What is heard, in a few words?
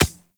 Beach Ball Bounce Dirt; Beach Ball Floof; Water Fight